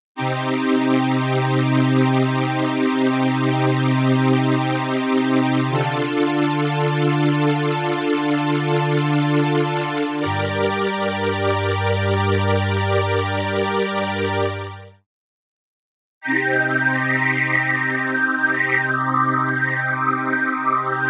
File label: vst filter; nibiru 3